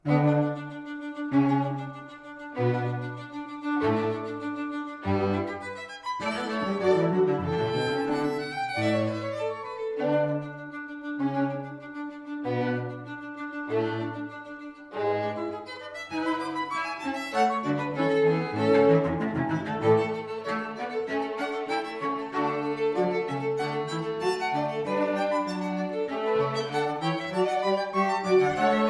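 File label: string quartet D major; asplmayer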